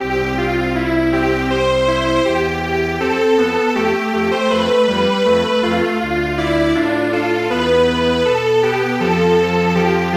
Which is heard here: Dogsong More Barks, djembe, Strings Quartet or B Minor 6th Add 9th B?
Strings Quartet